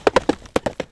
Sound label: horse move